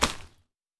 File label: Forest